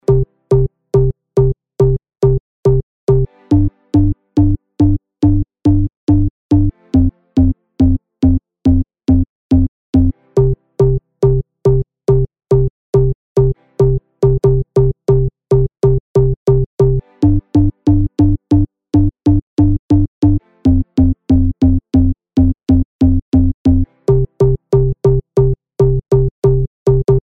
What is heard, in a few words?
Bass EDM House